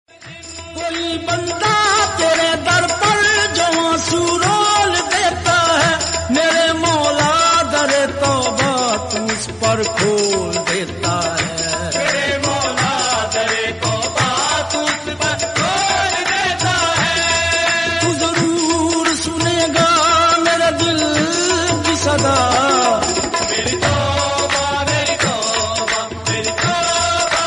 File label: hindi qawwali